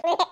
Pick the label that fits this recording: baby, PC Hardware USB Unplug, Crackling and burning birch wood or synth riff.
baby